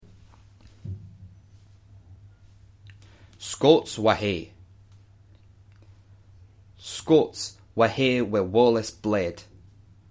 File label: Scots Wha Hae